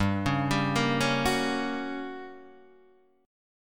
G Minor Major 7th Flat 5th, G, down, Guitar, Open D